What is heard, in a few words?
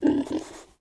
pig ack; propack